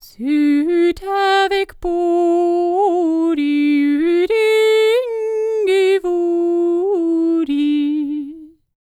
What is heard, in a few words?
SCANDINAVI, World Voices; ILIO; Vocal Planet VOL-5